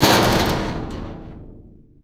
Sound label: sharp resonant sheet metal hit